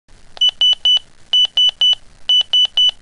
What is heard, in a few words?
minitor 5 tone 3